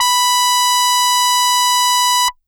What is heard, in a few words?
Hard Brass DX100 B5